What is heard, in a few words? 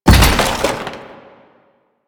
Motorcycle Collision Fast; Vehicles; Warframe; Motorcycle Ninety Nine